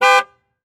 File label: Transportation Car Monte Carlo Exterior Horn Beep Short